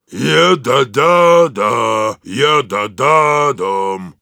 Heavy singing ru

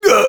Sniper pain sharp, fr

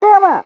taunt

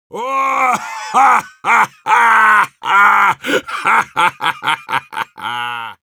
Heavy laugh long, ru

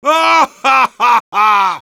laugh happy; Risa feliz 2; Respuestas del Heavy

